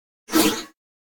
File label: Whoosh Fast Sci-Fi Small Air; Hollywood Edge; sound designer's toolkit v2